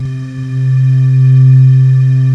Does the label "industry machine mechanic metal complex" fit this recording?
No